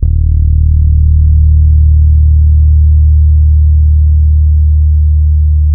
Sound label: E 2, old soul, bass; Spectrasonics; Bass Legends